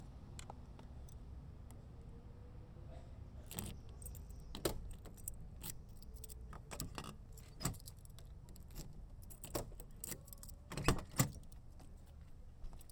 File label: car